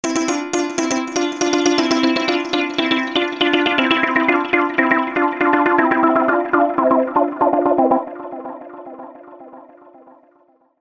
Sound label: Rezo syn